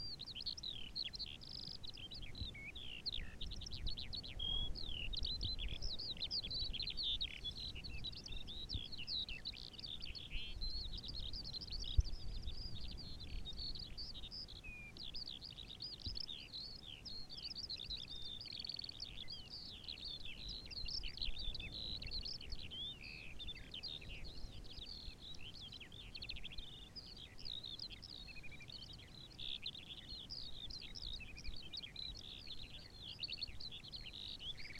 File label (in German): Feldlerche